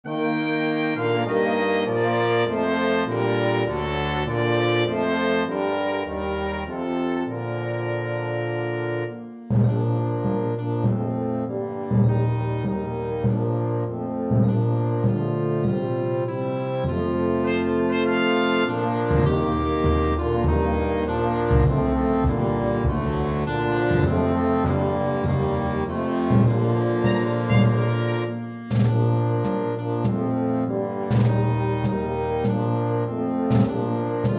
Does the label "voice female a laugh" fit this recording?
No